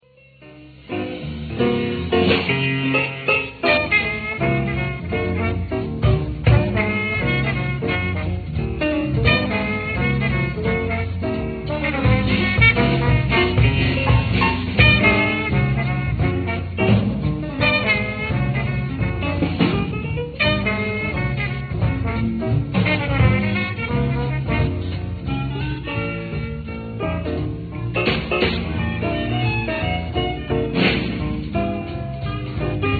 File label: smooth